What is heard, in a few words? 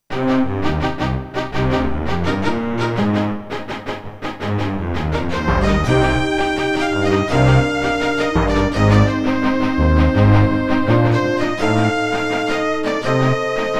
Intro MT32